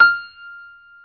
Piano F5

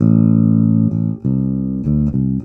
Rock, Bass; ACID MUSIC

